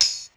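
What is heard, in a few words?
pss795 Tambourin, percussion; Polyend; Legowelt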